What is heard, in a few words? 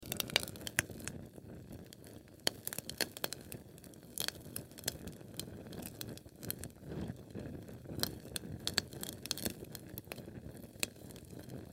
Sterno Flame Crackle Catering Food Tray Loop; Flames; Flame Sound Effects